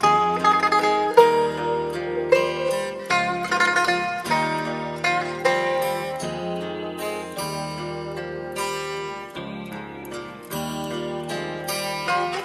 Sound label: guitar, Jazzmatazz loops